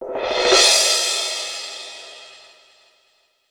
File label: sfx perc